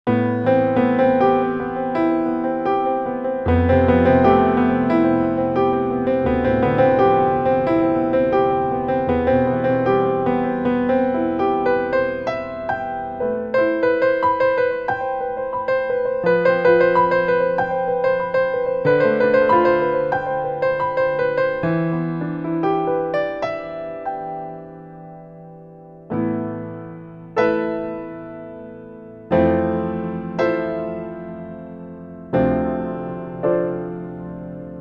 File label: piano